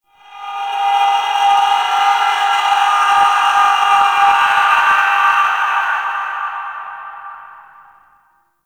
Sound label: Void Ghost Capture Started; Void Tower